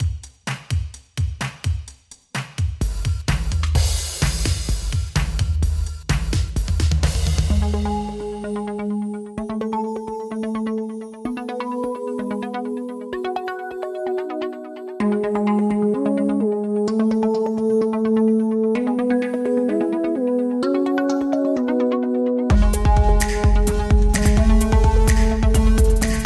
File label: EDM